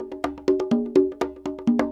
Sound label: PERC LOOP ETHNO 125